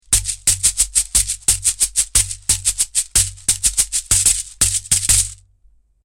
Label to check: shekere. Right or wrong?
Right